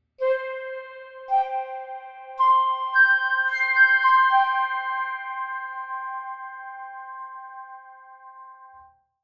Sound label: flute, Bechstein D 282